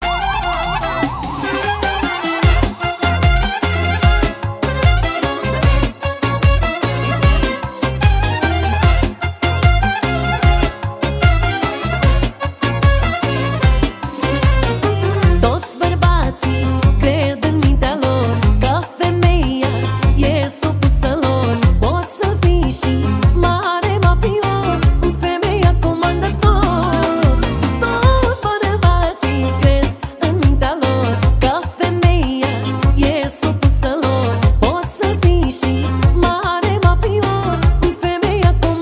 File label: Sax